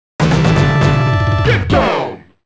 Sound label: Lose; super 32x 15th anniversary album